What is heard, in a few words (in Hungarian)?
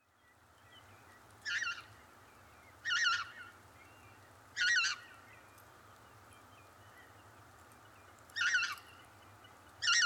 kis lilik hangja